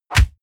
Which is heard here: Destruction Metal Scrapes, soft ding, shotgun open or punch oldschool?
punch oldschool